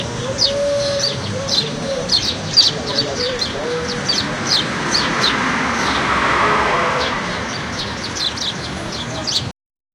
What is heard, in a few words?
crowded square in a cente, city